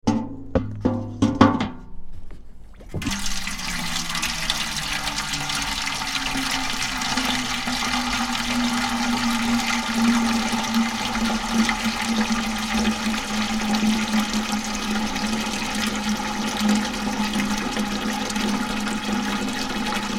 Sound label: Watering Can Metal Filling from tap; Clunk of watering can then filling from water butt; Pouring; Tom Hutchings